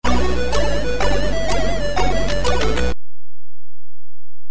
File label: game over; BionicCommando